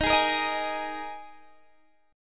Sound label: Ab Suspended 2nd chord